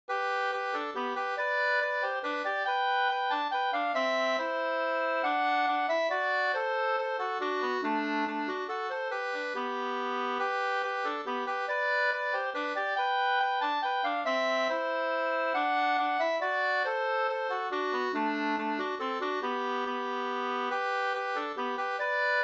hambopolska

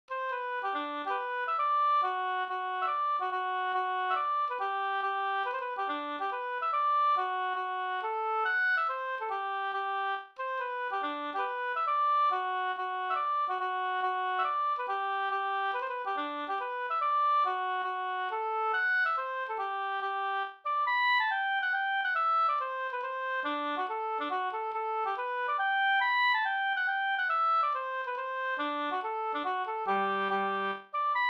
hambopolska

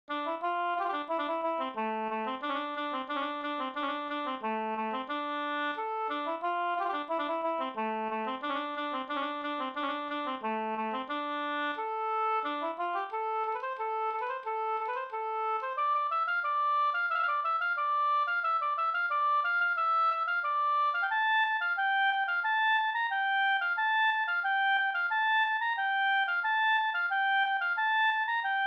halling; e Haltegutten e Mats Berglund

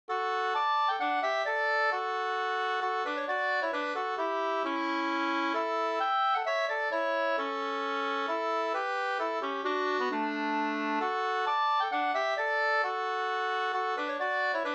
polska, 2st; Domar-Olle Rattvik

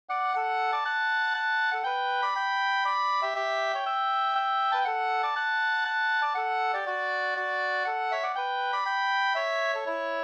polska, 2st; Glaboln Bjorkvik e Nyffen

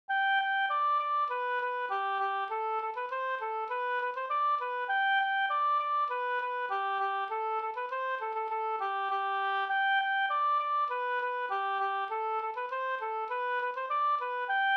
snoa

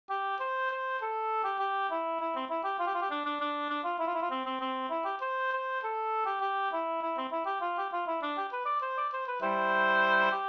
polka polkett; Kolar-Kristin fr Lovsta bruk

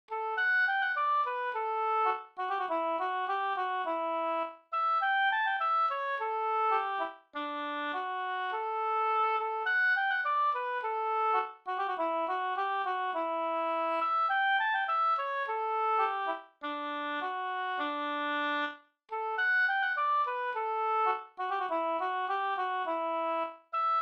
brudmarsch; Silvbergs brudmarsch

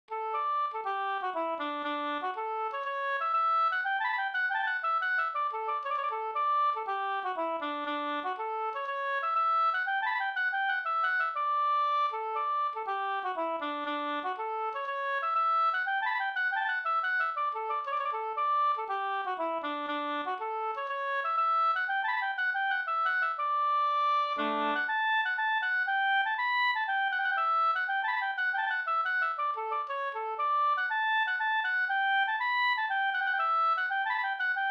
polska; Ante Falk Offerdal Jm

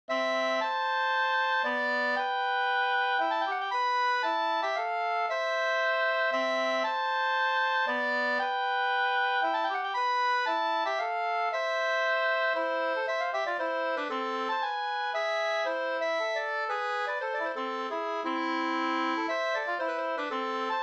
polska Bingsjopolska; Hornpolskan e Pekkos Helmer 2st

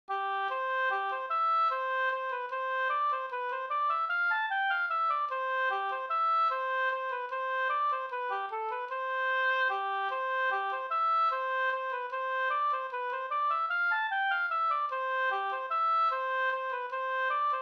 vals; Lindblom

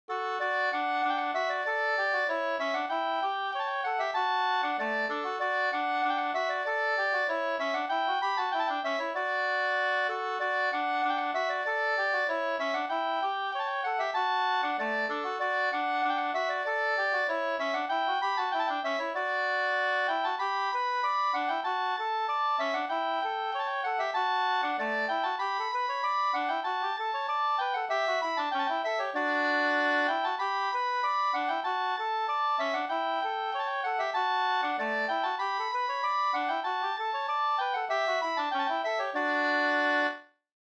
ganglat; Varmlandsnas

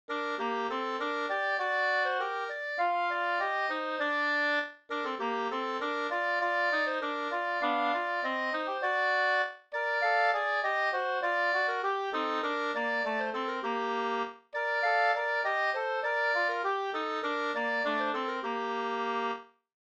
vismelodi; Liten Karin tjante

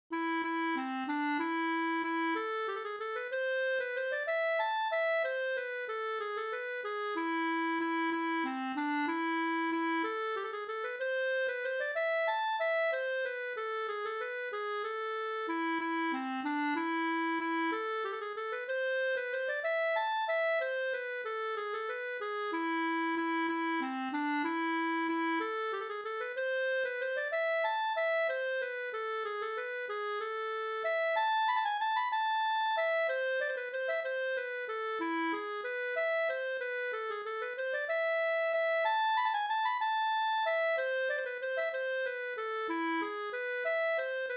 vals A moll; Johannes Johansen

